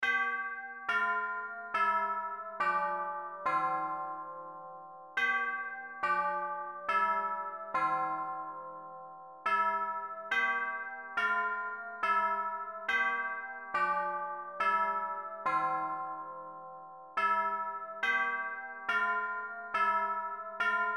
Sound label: sound of chimes on tubular bells